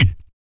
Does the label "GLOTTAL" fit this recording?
Yes